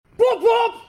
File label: poppop